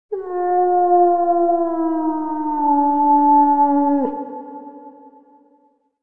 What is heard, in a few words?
nightwolf; EnvSound